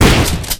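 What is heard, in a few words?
Drobovik Attack, shotgun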